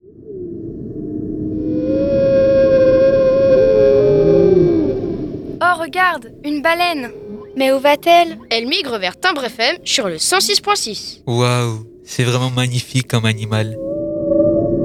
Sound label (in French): JINGLE BALEINE; Cite scolaire Borceliande